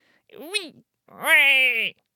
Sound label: Ice Mage Attack Vocals